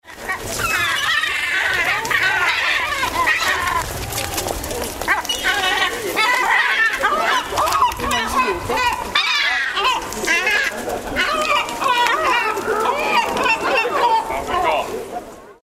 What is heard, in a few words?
yellow legged gull